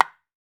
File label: rosewood, African drums; E-MU Formula 4000 Series Vol. 4; Earth Tones